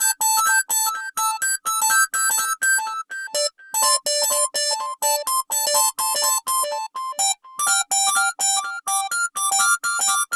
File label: Digital Ring, ringtones; Allview Soul X6 Xtreme